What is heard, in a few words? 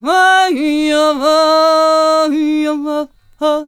MEH1 VOX, Balkanish Traditions